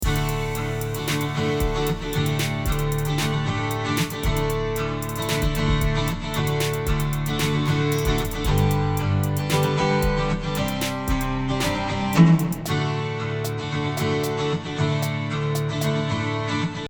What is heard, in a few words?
Strummed Guitar